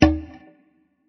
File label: NEW ROOTS REGGAE; Bass; Sonic Foundry (Sony Creative Software); Not Just Another Pretty Bass